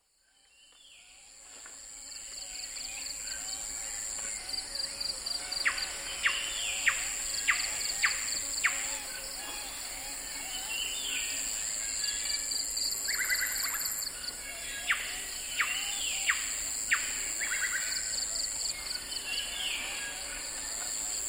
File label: frogs and insects in swamp; soundscapes